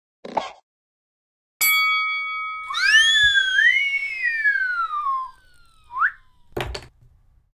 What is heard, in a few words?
Dreidel spin